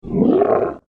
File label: Angry Troll, monster; fantasy